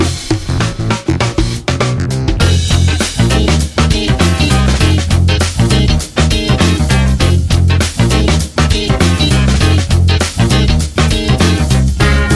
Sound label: Funk Delish Underscore